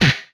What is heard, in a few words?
Gun, retro arcade; Guns